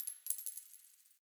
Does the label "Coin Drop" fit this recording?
Yes